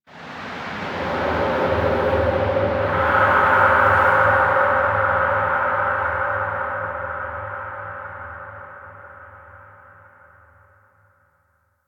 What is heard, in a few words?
Exterior Deep Abyss Hit, Ambience; Warframe; Railjack